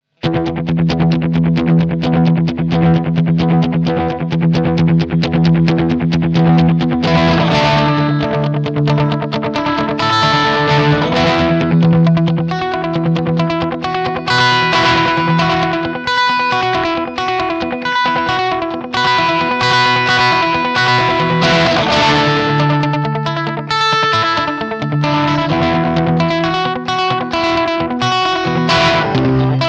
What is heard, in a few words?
MAXON AD999 Rhythm delay